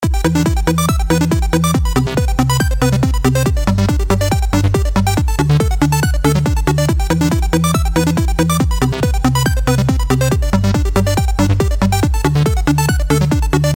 Happy electronic

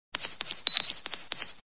Alert; Power Rangers

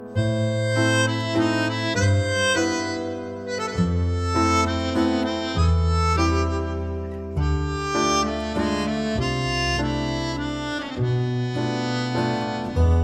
Waltz

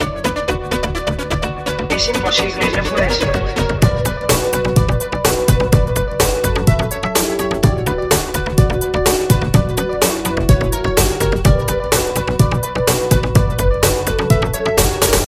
Techno pop

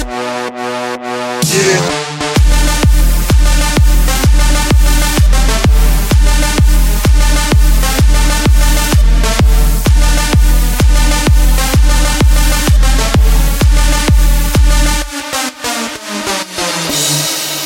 Trap

